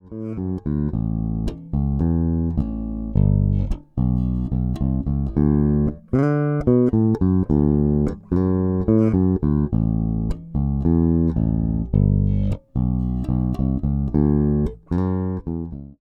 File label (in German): MB 4 SBK Deluxe Series beide Spulen; Harley Benton